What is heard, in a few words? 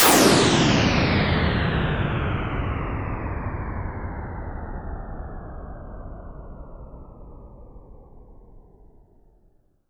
FX Sweep Down; vengeance essential club sounds-3